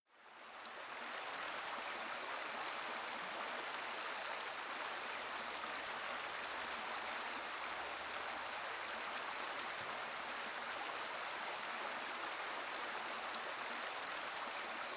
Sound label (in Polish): pluszcz zwyczajny Cinclus cinclus